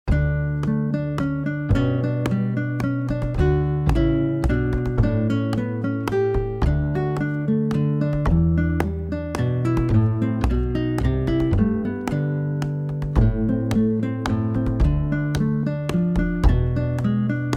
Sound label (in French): menuet; songes